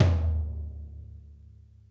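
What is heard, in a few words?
floor; Sonic Reality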